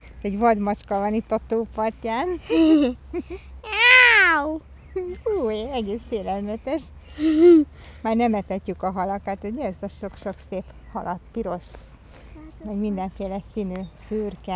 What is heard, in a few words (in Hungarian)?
vadmacska; szegedi zoo 2011; gyermeknap gyermekszaj